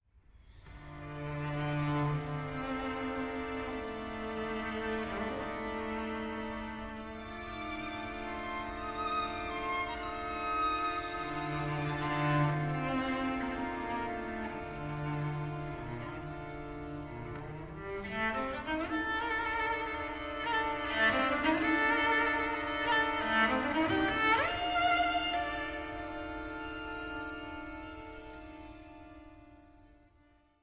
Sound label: String Quartet Poco lento